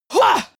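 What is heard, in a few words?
female combat attack short, screams; schreie; menschen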